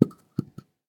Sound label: Shell 12ga carpet; STALKER; IWP